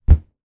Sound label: balloon inflated catch in hands; zapsplat